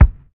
LO FI BD, Drums Dance & Groove; USB Soundscan vol.11; AKAI

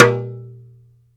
BONK, CARTOON

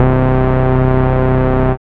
BAS, Eurotech; Sounds Good

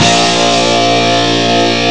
super distort; Amiga Soundtracker Sample Packs

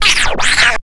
backscratch, scratches; Zero-G; Freak Beats

